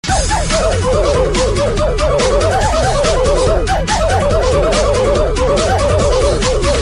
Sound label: Uhh Uhh Uhh